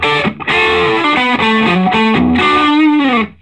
SOLO, Vintage Blues Guitar

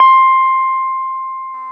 GUI SUS C 5, E-Guitar; E-GUITARS

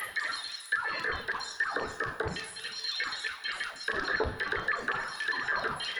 Tonal Re Draw, sparse soundscape

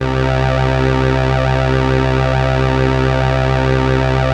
SIEL, String Machines; Best Service; XX Large Most Wanted 2; SIEL-CRUI

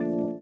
VIBRA; Spectrasonics; Metamorphosis

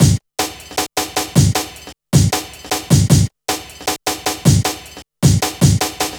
Hard Step, Drum Loops, Jungle, 155; Jungle Warfare 1&2

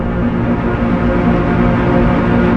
TREMOLO, STRINGS; Rubber Chicken Software Sample Bank; REAL INSTRMT

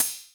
crash, Millenium MPS-400

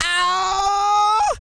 E YELL, MALE HUMAN; ILIO; Vocal Planet

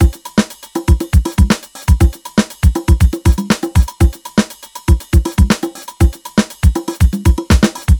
120 beat full, Nu Jazz House; PowerFX

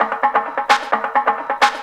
drum 130 perc, drumloops; Techno Trance Essentials

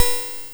cymb, cymbals

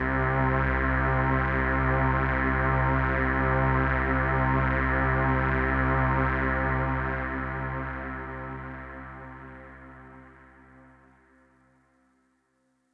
Pad C, Synth Prophet One Shots; Electronisounds

